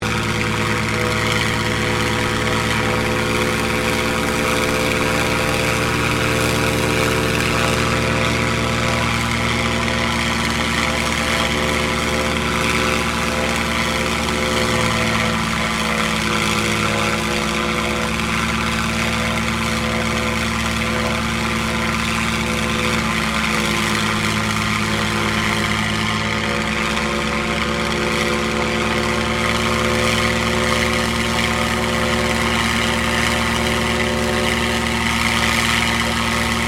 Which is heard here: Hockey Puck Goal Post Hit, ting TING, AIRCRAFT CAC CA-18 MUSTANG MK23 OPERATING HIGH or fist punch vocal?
AIRCRAFT CAC CA-18 MUSTANG MK23 OPERATING HIGH